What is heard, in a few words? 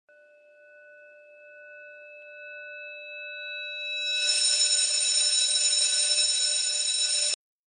school bell rev